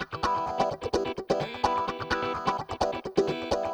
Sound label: Electric Guitar 128BPM E min; Vengeance Samples; VEH3 Electric Guitar Kit 2